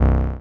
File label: noise